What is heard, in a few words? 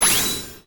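Sound Effect Battle Assist On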